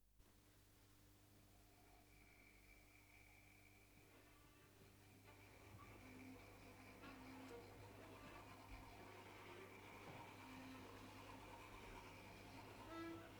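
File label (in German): Bewegungen Orchester; Siegerland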